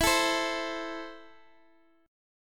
E Suspended 4th Sharp 5th E, down, Guitar, Standard; Esus4#5 strummed